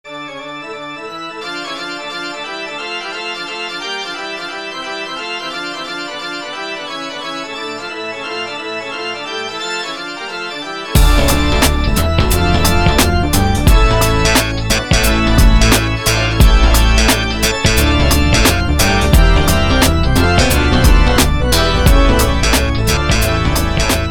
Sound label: toccata in d minor; Bach’s Toccata in D minor BWV 538